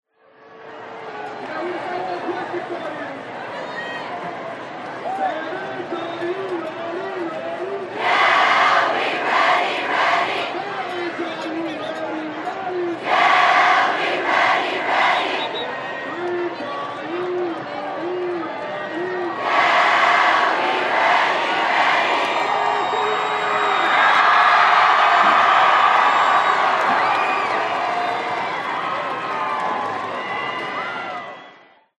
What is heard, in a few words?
american football crowd shout chant yeah were ready ready unison announcer cheers